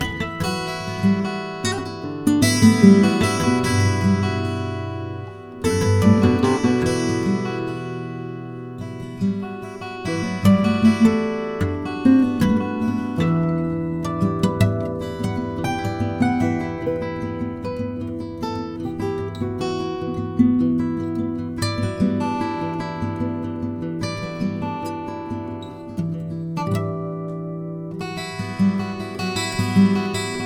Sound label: Camp Guitar B